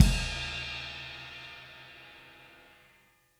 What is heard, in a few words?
Drums Ending, 70s Analog Funk; Sonic Foundry (Sony Creative Software); What it Is!